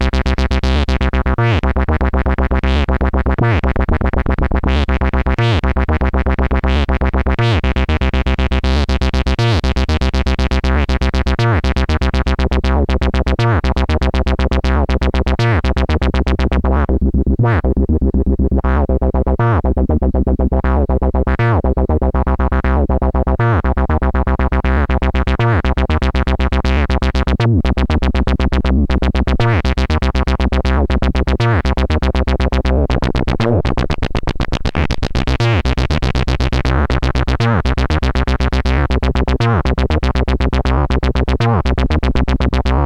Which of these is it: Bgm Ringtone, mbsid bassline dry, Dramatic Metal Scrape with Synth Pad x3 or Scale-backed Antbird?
mbsid bassline dry